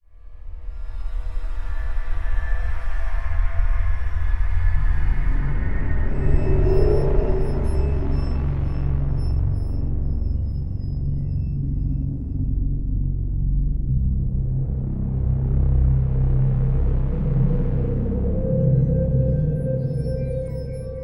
Forge Amb; yaiba ninja gaiden z gamerip 2014

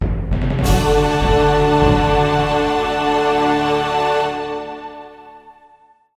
Short Victory; Magic & Mayhem: The Art of Magic

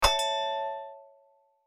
Lifebuoy Collection; My Cute Commissar

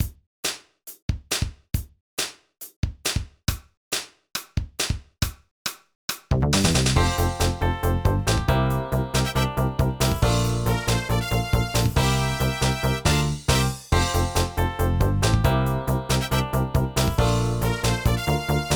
Pop Stage Karaoke; pinky street kira kira music hour pop princess gamerip

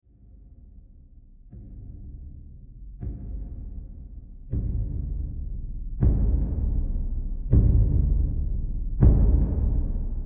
Ceremonial Drums; Age of Conan Hyborian Adventures

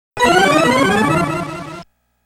DEATH (Death)

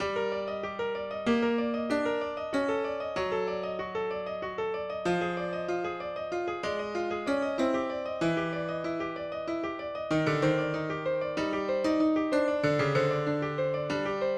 Splendid Piano; zelda cge the journey within

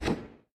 Flap

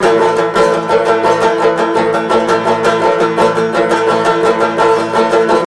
banjo

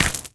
walk stone

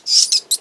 rat squeak; Devour

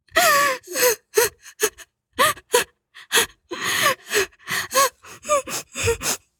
moan

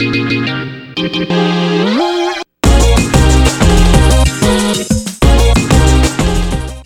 stage clear (level complete); ape escape 3

